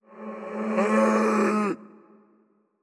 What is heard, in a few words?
Skell Scream; Necro